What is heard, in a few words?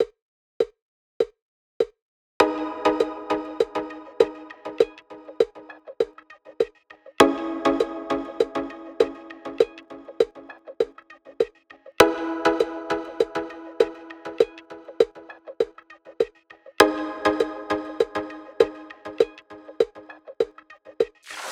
Dancing on Mars 120 Click, backing tracks, percussion